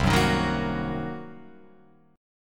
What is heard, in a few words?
C Minor 7th Flat 5th C down Guitar Open F; Cm7b5 strummed; JGuitar